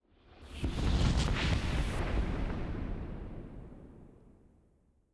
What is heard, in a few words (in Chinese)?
玄武岩三角洲地表声; ambient